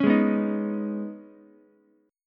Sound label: C sharp 7th Suspended 2nd, C sharp, down, Ukulele; strummed; chord